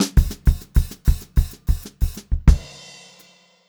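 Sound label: STRAIGHT FILL, Funk-Groove Drumloops; USB Soundscan vol.38; AKAI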